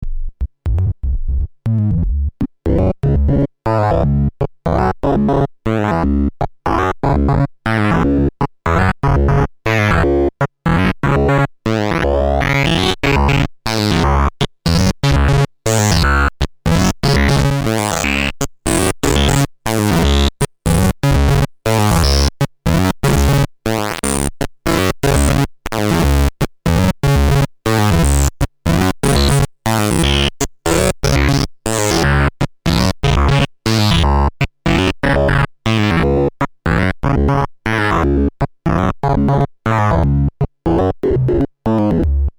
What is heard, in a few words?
Osc Mode Audio In